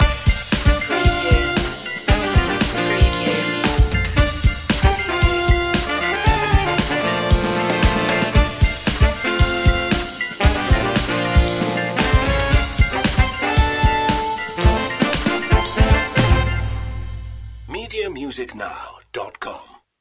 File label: the funky two